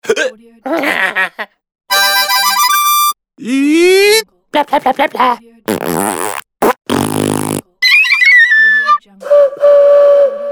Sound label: funny sounds; alexstokke